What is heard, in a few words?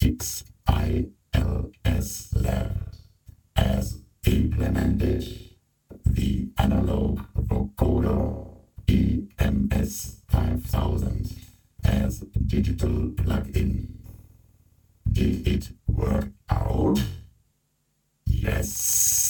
EMS 5000; XILS